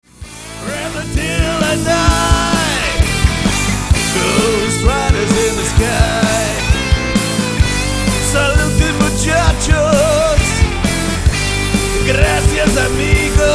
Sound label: southern rock